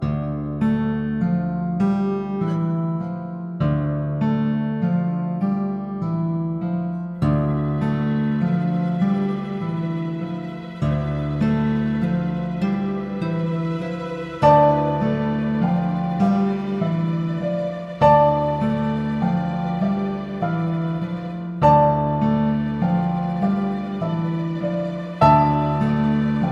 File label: Sad - Difficult Decision LOOP